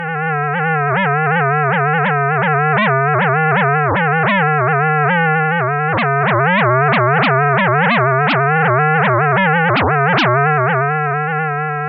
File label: steps